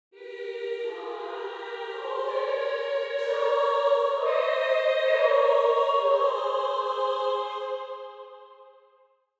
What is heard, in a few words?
Angelic choir angels; in the realm of angels we unite